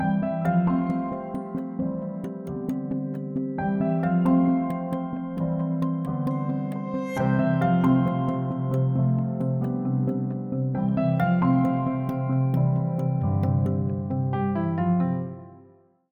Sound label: Cheerful Greeting, ringtones; Huawei Mate XT Ultimate